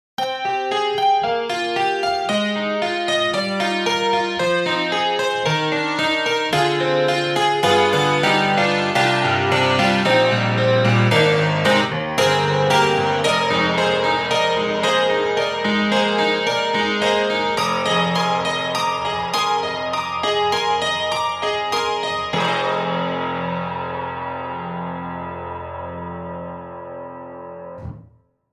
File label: Grand Rhapsody Piano, Honky Tone Key; Waves